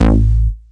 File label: bass fun A, Bass Shots, Chicago House; Classic Chicago House